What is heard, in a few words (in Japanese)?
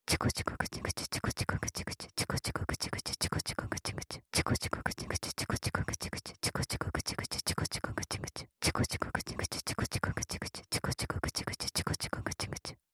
オノマトペ ちゅこぐちゅ（速）; オノマトペ素材